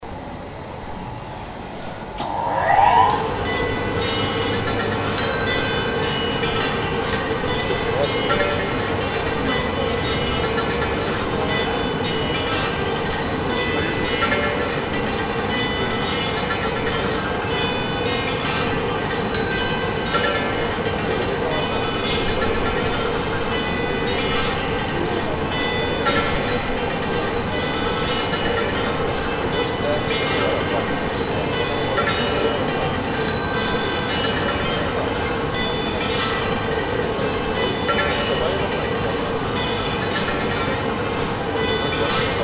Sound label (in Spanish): SIRENAS; Pablo Schalscha; chile